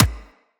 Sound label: Weapon Punch Pistol